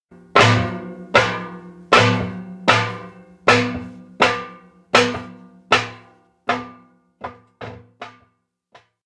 steps indoor steelstairs up; minato; anemoi パッケージ版 1.1

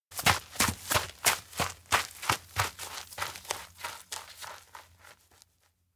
steps outdoor ranch run; rikka; anemoi

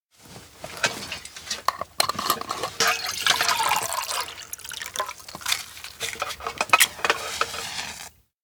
meal prep boil share; anemoi

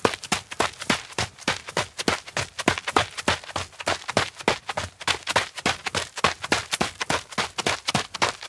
steps outdoor forest run follow back; koyomi; anemoi

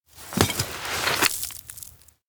dig fossil; koyomi; anemoi パッケージ版

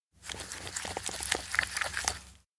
make mayo; anemoi

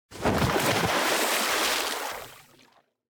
lake whirlpool dive; anemoi パッケージ版

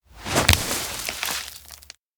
grab branch; fumiya; anemoi パッケージ版 1.1

